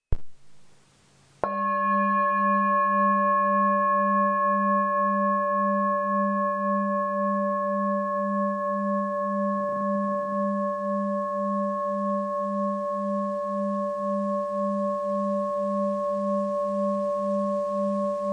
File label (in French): Bol chantant sol